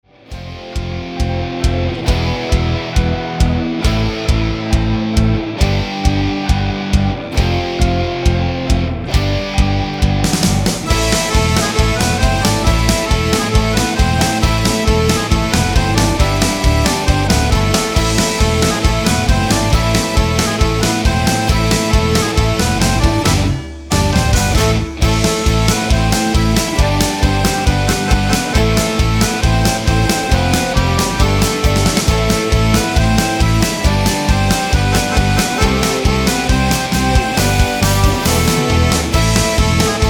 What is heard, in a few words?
instrumental